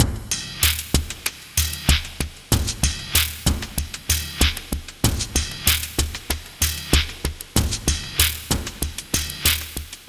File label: SPACE SPORE BEAT, HYPERSPACE PULSE GROOVES